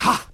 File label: ha, Voice ooh ahh